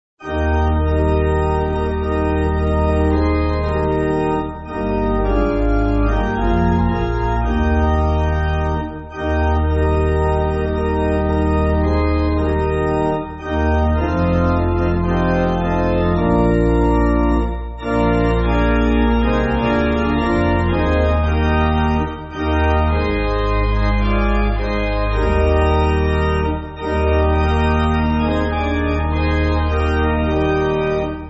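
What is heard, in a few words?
I've Seen The Face, pipe organ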